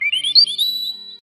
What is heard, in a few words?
weather vane